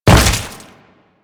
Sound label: Step Goo, Footsteps; Warframe; Grineer